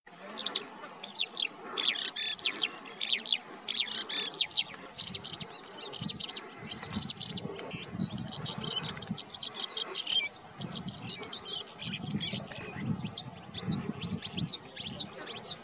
kalanderlerche, vogelstimmen

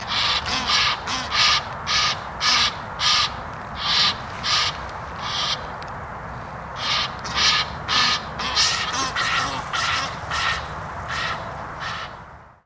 nilgans

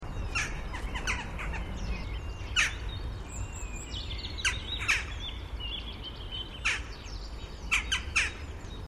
dohle, vogelstimmen

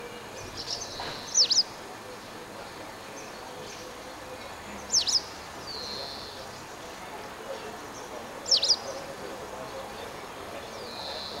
bachstelze; Motacilla alba; Stelzen

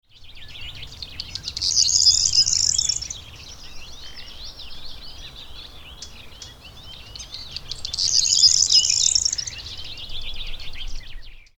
grauammer

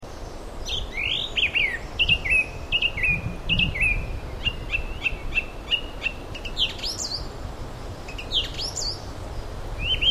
singdrossel; Turdus philomelos; Drosseln

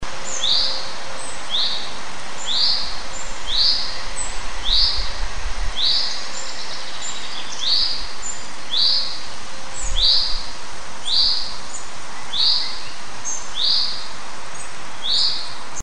vogelruf

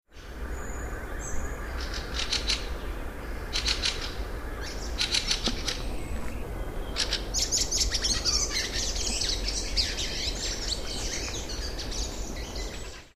wacholderdrossel

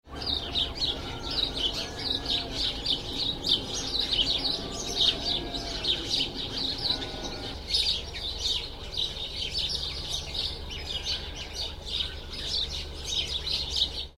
italiensperling

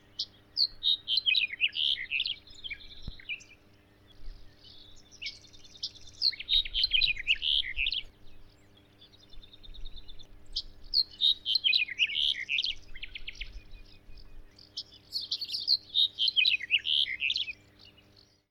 kappenammer

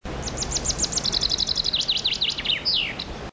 buchfink

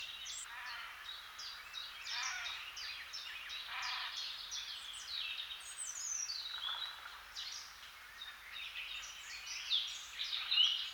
seeufer

